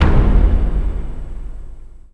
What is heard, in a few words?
drum sample